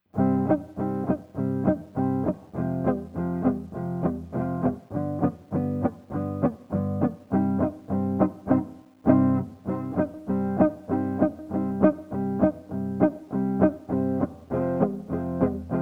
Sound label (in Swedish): tenor